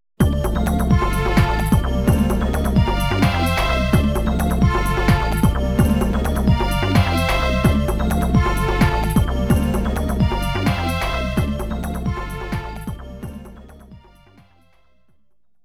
Winning; Vampire Sound Box; Darkstalkers; AULBATH

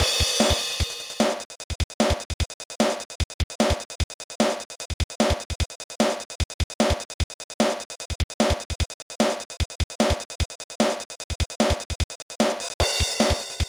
A 16-Beat Attack

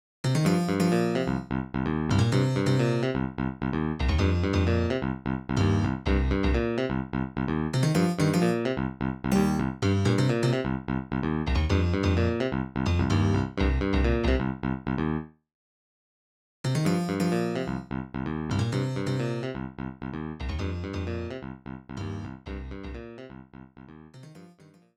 Roland SC-88; SimCity 2000

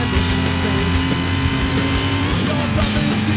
Sting; Ford Racing 3; playstation 2

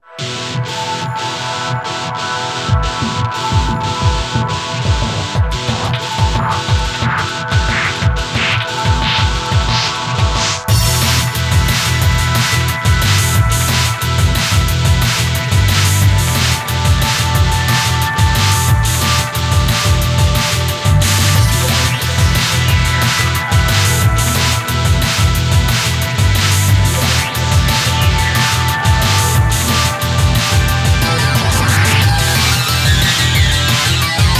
8 Guitars; F-Zero GX; F-Zero AX; Sand Ocean

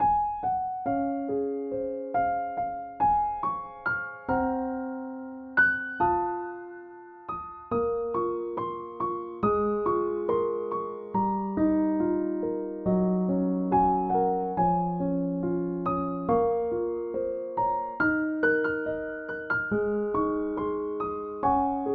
piano

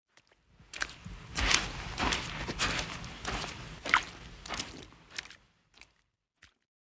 Footsteps Sand; The 39 Steps

